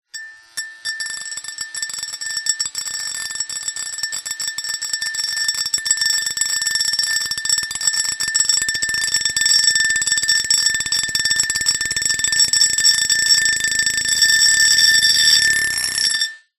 foley glass bottle hair trimmer on against vibrate; zapsplat